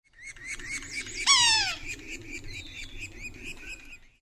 yellow bellied sapsucker

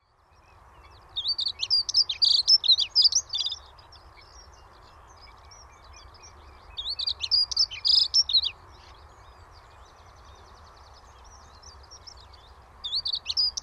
dunnock

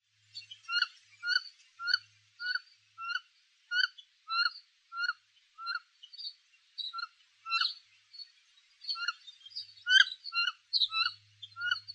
wood duck

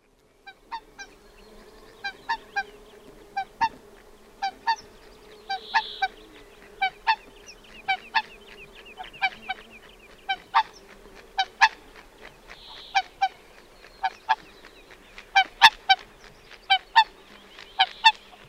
whooper swan

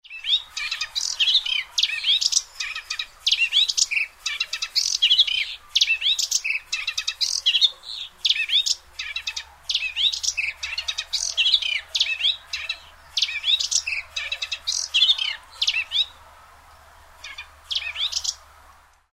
subalpine warbler

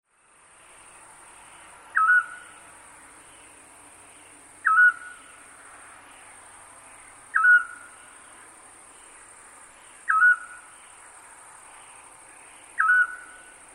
scops owl

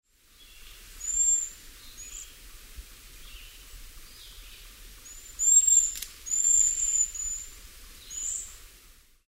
cedar waxwing

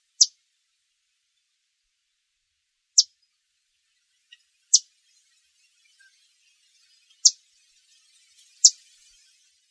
yellow warbler

